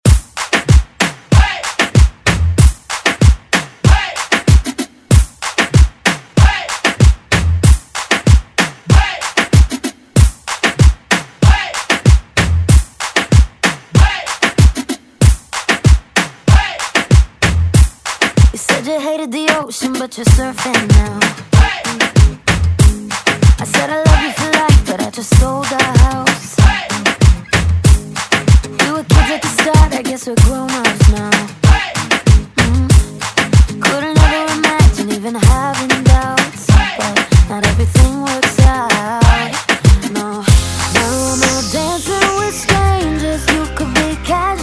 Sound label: Reggaeton Redrum